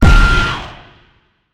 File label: ghost from bag; smite; zhongkui